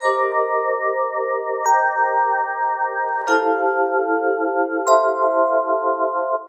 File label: Piano Classic, Drum & Bass Keys; Sonic Foundry (Sony Creative Software); Knutrix Drum & Bass